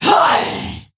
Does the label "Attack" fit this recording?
Yes